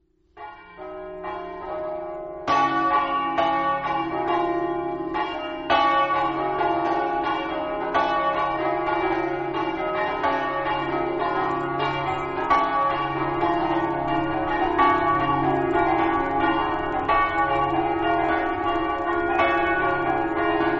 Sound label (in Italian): camino, campane